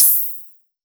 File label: Open Hihat; Cymatics; House Starter Pack; Cymbals